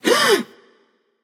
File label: breath passion; chaac